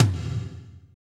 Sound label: TOM, Power Ballad; Northstar; Drumscapes Roland